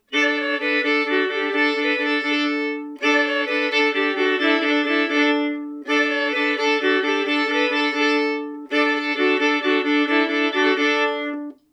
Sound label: Jig